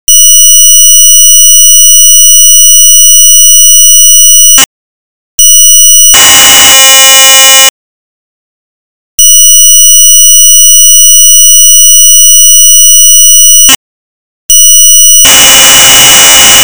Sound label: 3600 baud; caslink2; polar; ipod